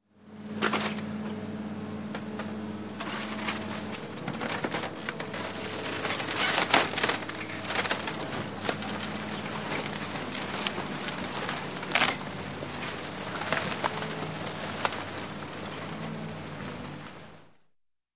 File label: Crushing Wood And Metal Bulldozer Running Over; Sound Ideas 2000 Series